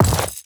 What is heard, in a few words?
quiver equip, inventory